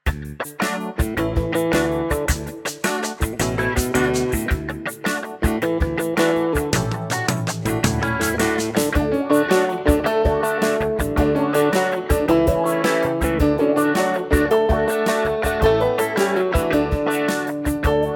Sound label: clothes karaoke